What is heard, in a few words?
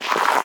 snow wading; Scrat